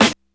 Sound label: SNR; Gangsta Sound Kit